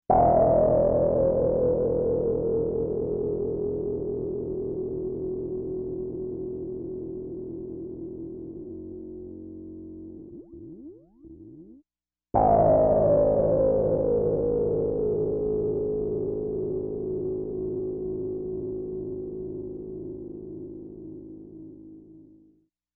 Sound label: FAST LFO FILTER DECAY